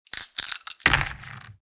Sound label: Board game map dice toss and roll; leisure